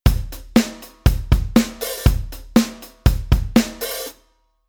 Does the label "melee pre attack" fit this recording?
No